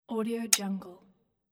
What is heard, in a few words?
app button